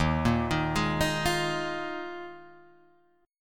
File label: Eb Suspended 2nd Suspended 4th, Guitar, Open G